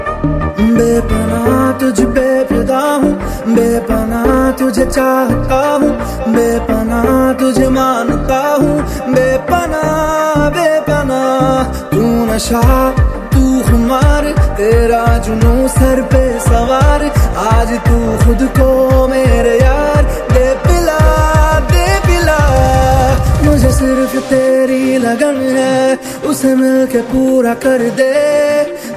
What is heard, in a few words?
New Hindi Love Ringtone